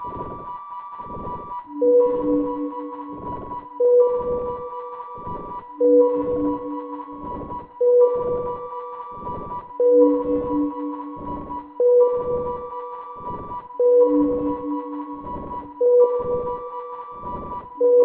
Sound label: Sin Wave